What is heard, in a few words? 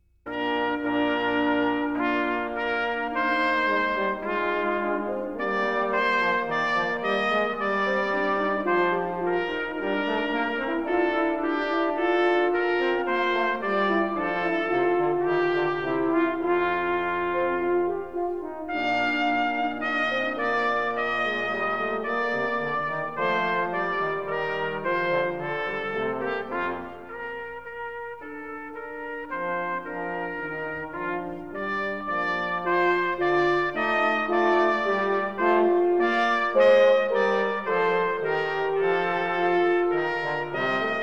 Adeste Fidelis (instrumental)